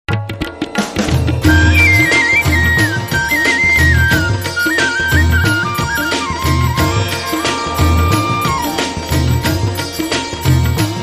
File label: Hindi Beats